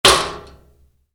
Wood hit aluminium heavy, Wood, Impact: Aluminum, Heavy; Materials; Wood Sound Effects; Wood (Sound Effects:Materials); Stuart Duffield